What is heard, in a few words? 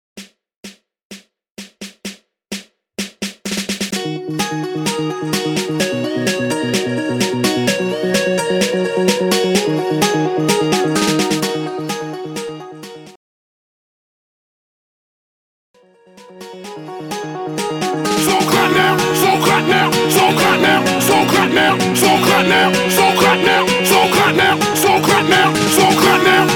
128 BPM